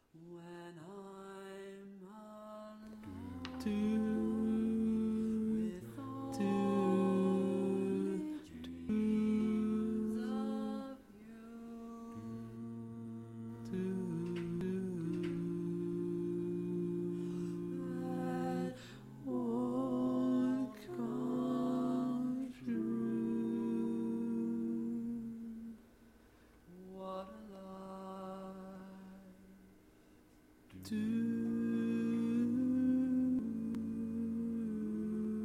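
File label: Baritone, barbershop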